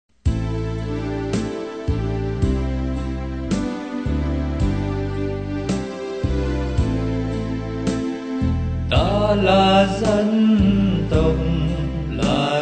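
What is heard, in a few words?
soprano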